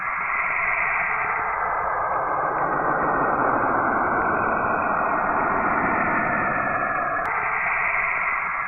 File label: space horror; duke